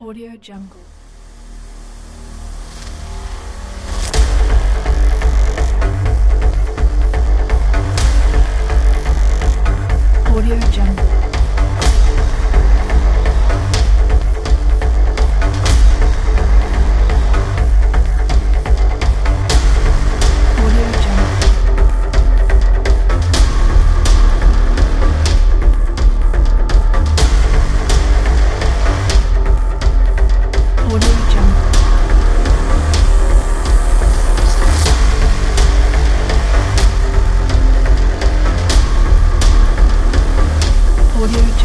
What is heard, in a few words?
energetic percussion sport